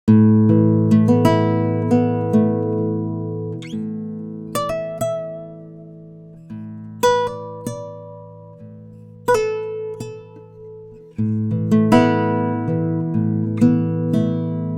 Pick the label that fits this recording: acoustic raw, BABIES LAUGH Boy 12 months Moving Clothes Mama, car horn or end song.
acoustic raw